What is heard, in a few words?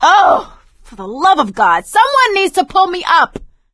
ledge hang middle; Left 4 Dead 2; producer